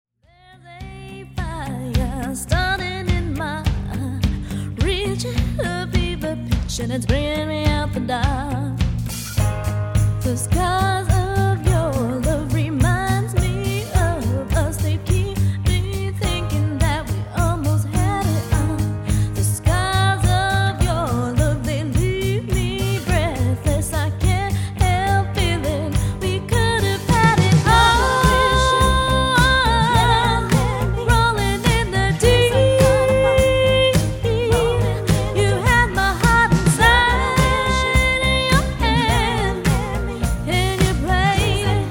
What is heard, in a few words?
cover band